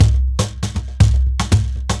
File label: DRM